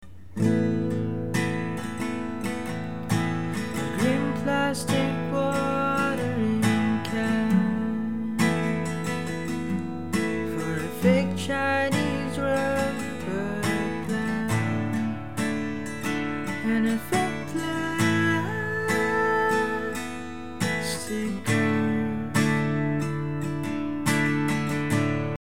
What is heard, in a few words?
Mic only without echo vocal and guitar